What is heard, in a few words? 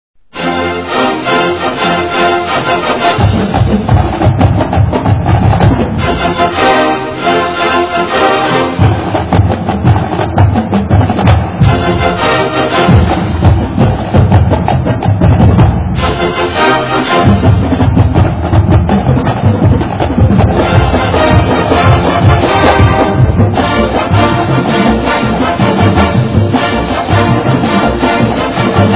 Hawaiian War Chant; ncaa; michigan